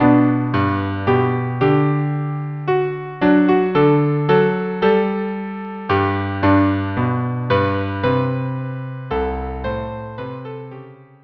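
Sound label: viola cello duo; que dattraits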